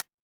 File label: ui hover